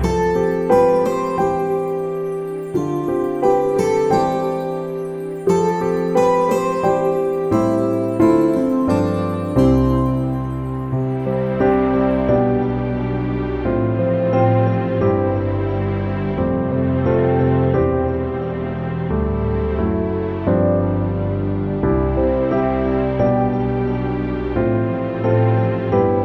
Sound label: Beautiful Star Backing